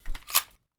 Sniper Remove Mag

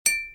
cling

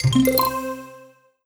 melody

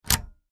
input

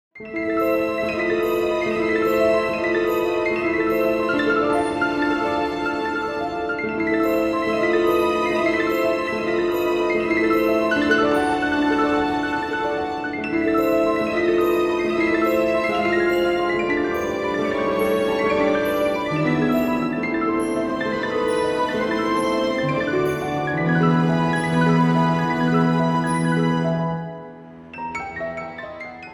warm, bgm

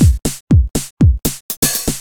beats; csound-manual-react

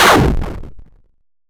Hard Crunch

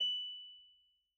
incoming message

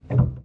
piano

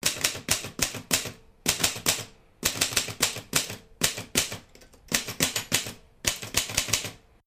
Type Write

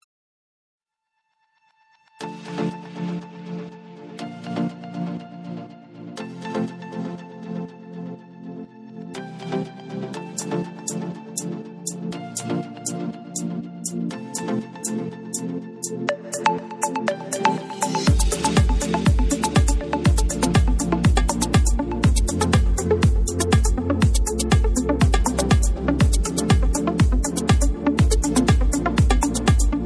music

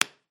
plastic

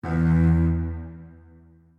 sys Error Long

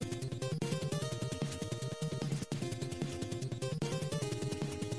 jungle theme; task acknowledge; contra

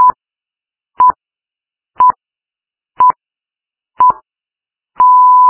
the pips

